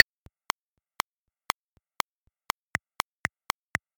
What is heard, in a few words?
Metronome pattern straight